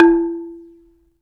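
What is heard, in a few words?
African marimba; Roland LCDP11; Africa VOL-1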